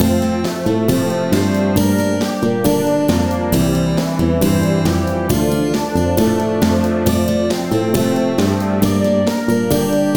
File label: F Gm C Dm F Gm C F F Bb C F F Bb C F Horns